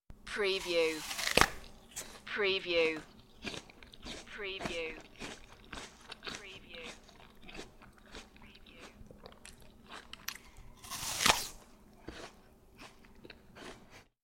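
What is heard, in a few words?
Eating Apple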